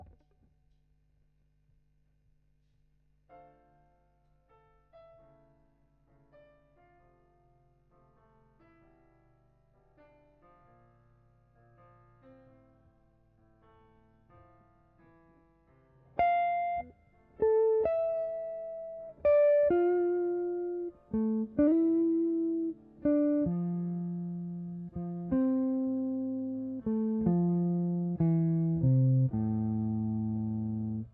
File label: GTR B